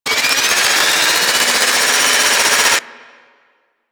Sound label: Fortress Turret Wind Up; Ambience; Warframe; Grineer Fortress